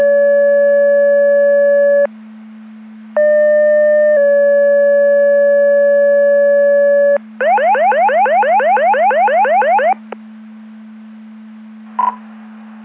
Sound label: Siren; Andover Twp Boro